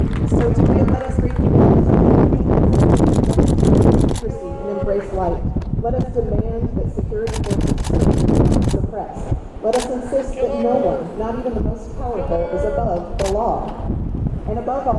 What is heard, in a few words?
Protest, Killers Drive by